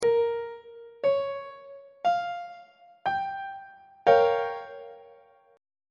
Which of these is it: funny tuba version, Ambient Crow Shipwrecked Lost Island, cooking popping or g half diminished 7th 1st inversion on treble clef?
g half diminished 7th 1st inversion on treble clef